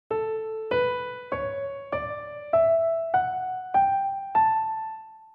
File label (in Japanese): Amix スケール